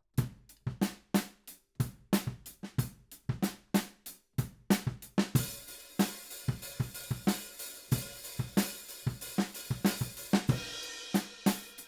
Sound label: Ride